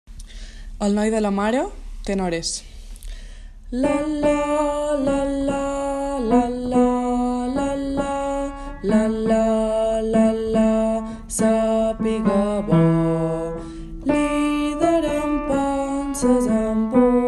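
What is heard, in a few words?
tenor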